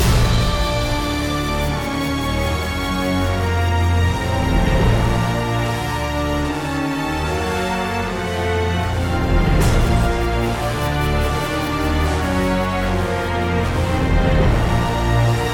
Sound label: Battle Loop; Infinity Blade 3